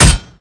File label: arm hammerpunch